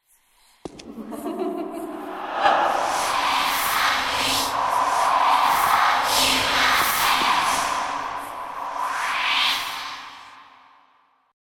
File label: failed